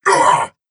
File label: pain; doomguy